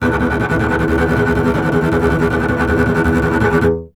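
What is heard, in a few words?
STR CBS, Solo Instruments, double bass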